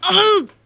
pain

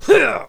pain; assassin

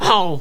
jump; player

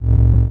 footsteps energy

